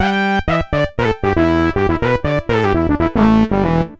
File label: Another Funky Riff, Keyboards